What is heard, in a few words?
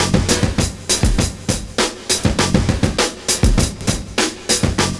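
OPEN FIRE, 100 bpm; Univers Sons - Kitch 70s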